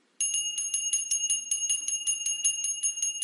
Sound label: time up